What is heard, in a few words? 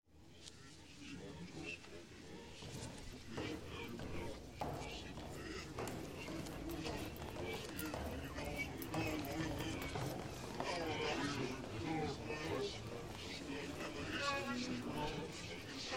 choose